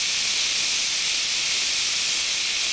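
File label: ambience steam; Star Wars Knights Of The Old Republic II; XBOX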